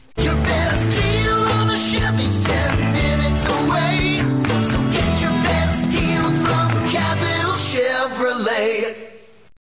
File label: jingles